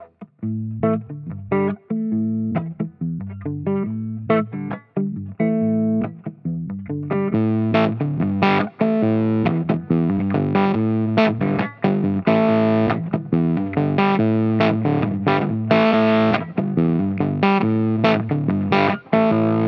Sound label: fuzzed guitar